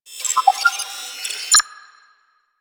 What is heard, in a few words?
Computers Random A; Warframe; Ambience; Grineer; Grn Computer Room 1